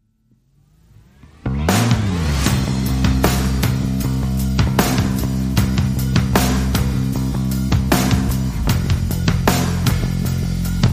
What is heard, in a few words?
Drums and Bass